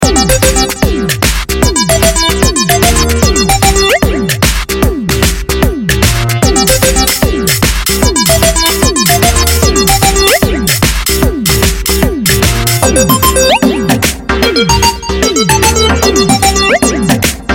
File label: Bounce, phone tones, Samsung GT-C3322; GT-C3322 India